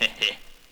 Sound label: laugh monster; ooh ahh